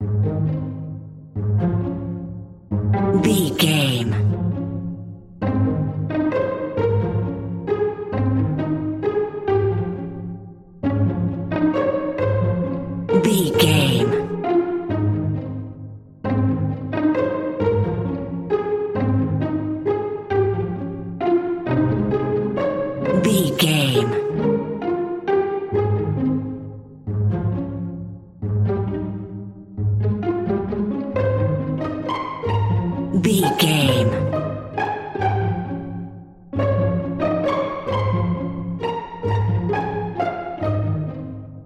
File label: rock bye baby pizz strings, Rock A Bye Baby Pizzicato